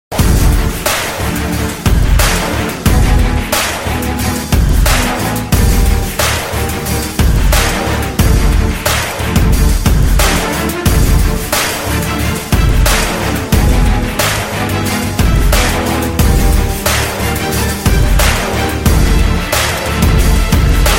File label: Instrumental